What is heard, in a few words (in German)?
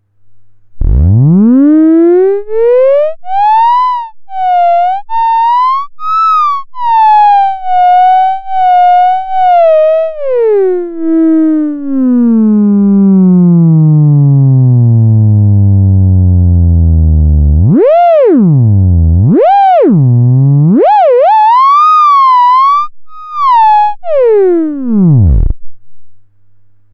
sinus